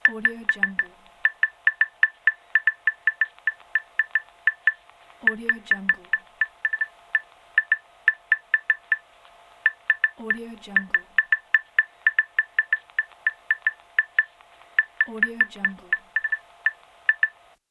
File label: morse code; fx-library